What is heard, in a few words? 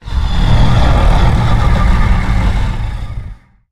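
Stealth Attack Start; Evolve; Goliath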